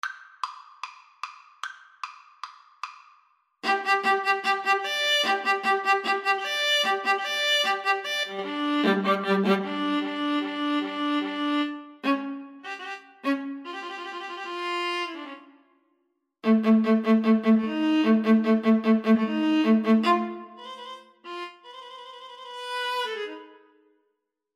Viola duet

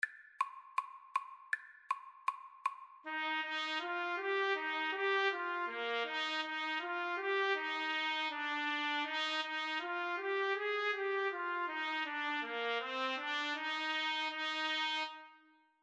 Trumpet Trombone Duet

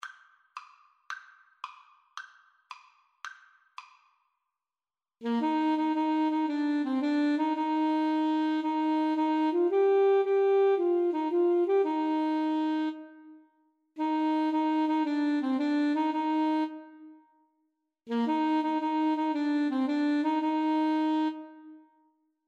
itsy bitsy 2 A SAX

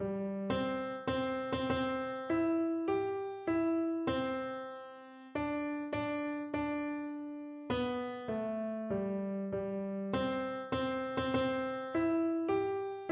wheels on the bus, piano

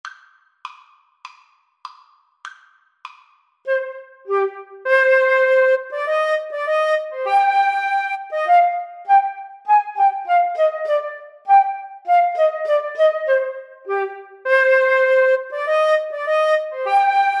Flute Clarinet duet; L'Arlesienne Prelude

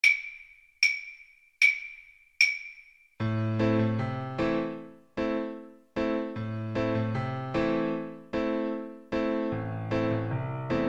reggae, piano

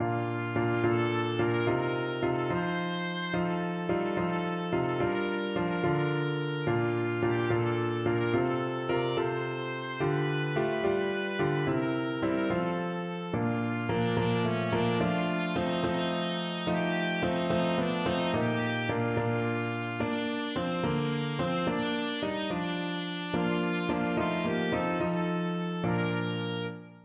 trumpet

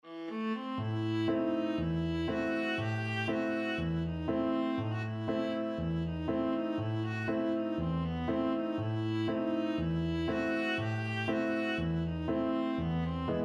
odessa bulgarish, viola

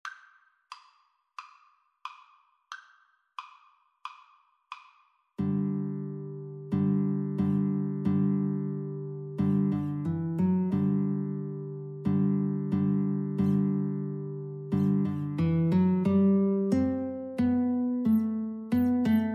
Guitar Duet

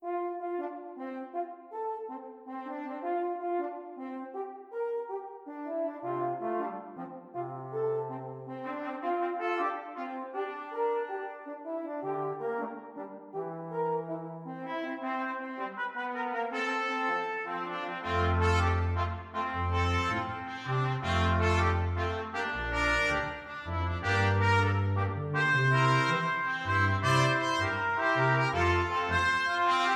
jingle bells salsa BRASS, brass quintet

